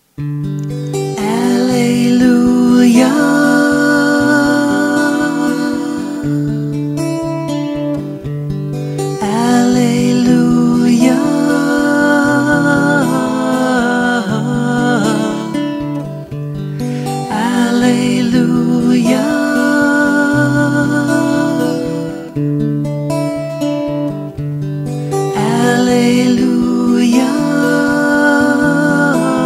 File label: alleluia, All Vocals and Piano